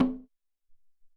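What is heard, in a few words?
High Doumbek, Alesis SR-18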